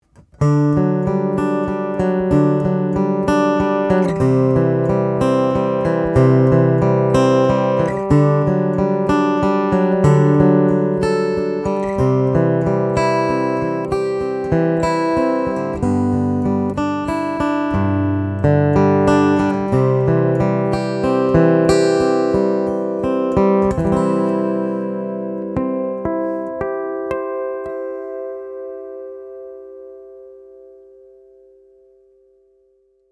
Cole Clark Fat Lady 2 picking piezo50 face 50 mic50